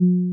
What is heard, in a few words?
wait drop; HA-MIC05R2